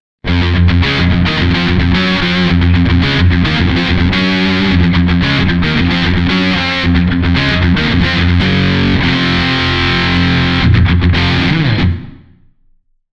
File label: british lead